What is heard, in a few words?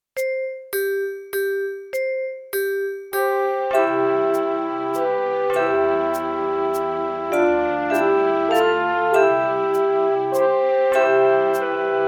autumn bells